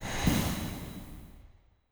Spa Heal; Hollow Knight (gamerip) (2018)

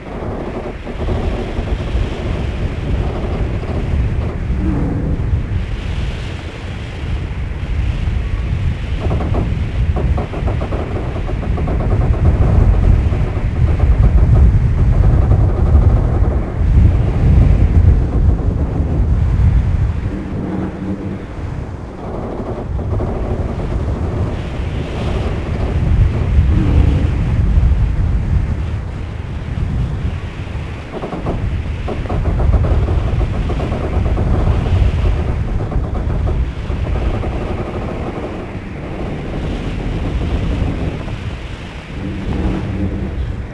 stressed metal and wind

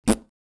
Glue over; Boardroomscene

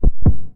Heartbeat

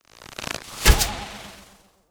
Skel Archer Throw; Legend of Keepers

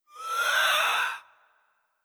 Skel Drake Elec

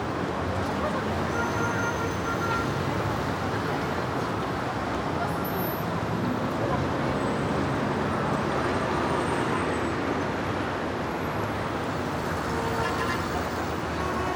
Amb Ground Loop

